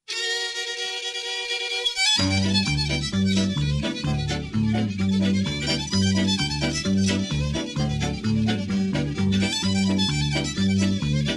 flop eared mule, Instrumental TRacks, square dance music